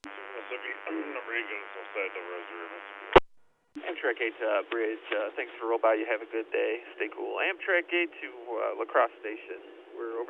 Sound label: Amtrak